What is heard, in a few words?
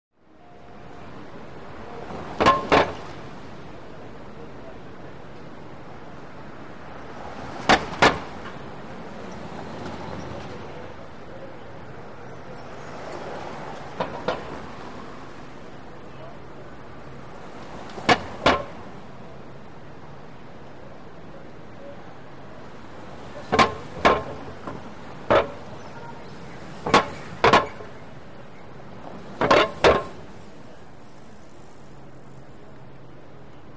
Light Steady Traffic Over Manhole Cover, Close. Quiet Cars By, Loud Metal Clunks. Bike By Distant Car Start.